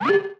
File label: Motion Design Riser; SoundsCrate